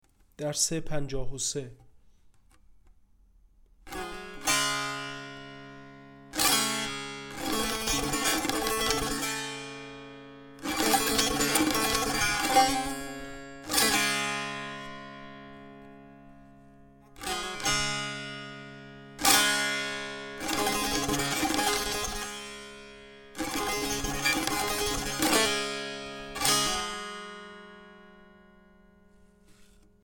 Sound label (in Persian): مشق تنبور